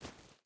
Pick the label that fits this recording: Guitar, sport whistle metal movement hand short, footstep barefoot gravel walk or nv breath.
footstep barefoot gravel walk